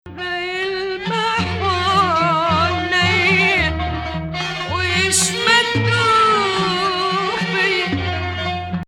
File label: Rast Hijaz